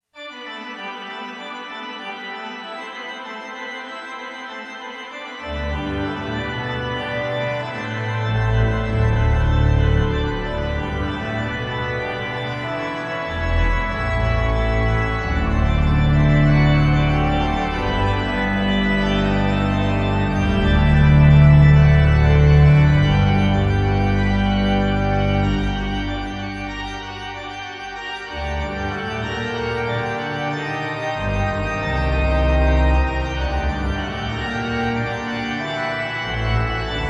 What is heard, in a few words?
Toccata Energico